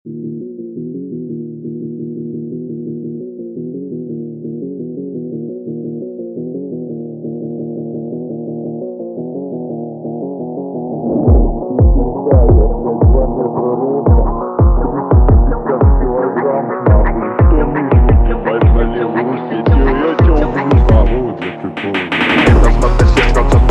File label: phonk; jelektronika